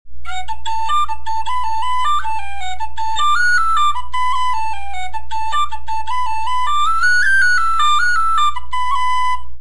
crmeth 3 part, Using this technique, the whole first part of the jig will sound like this, Tin-Whistle